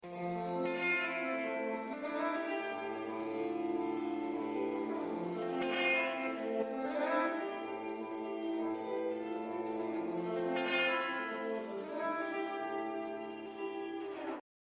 clean Hotrail

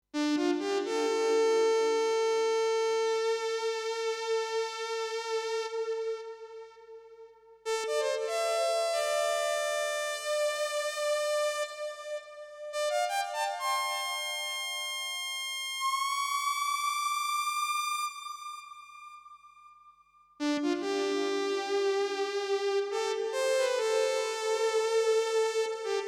CS50

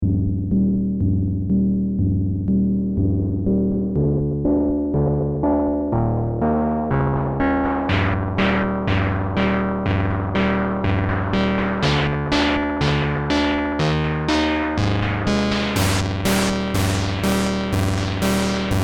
Moog Mother 32 Pulse Noise

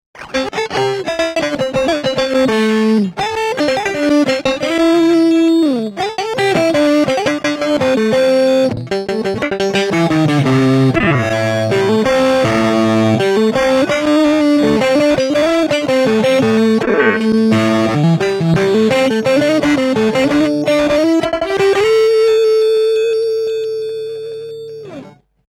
git interrupt; hologram electronics microcosm

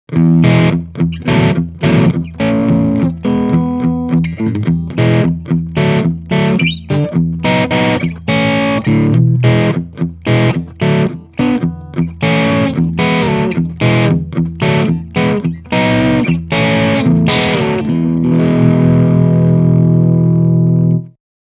Crunch Rhythm

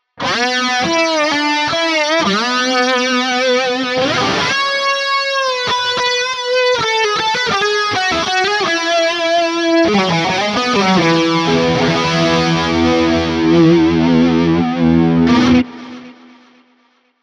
lead solo; Ampero

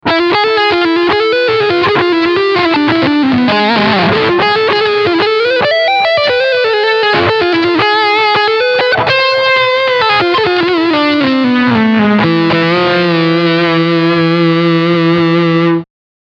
Leadsound Front PU

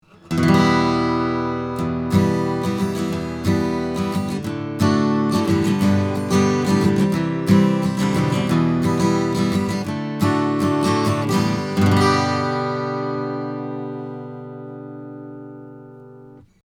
Fig8 Acoustic Guitar 50cm 15thFret; Neumann M49c

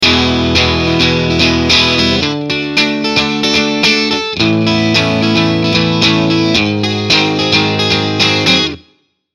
Piezo; Fame Forum IV

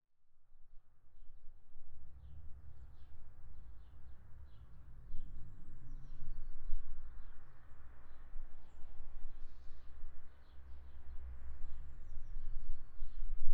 Atmo; RODE TF-5

